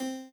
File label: chord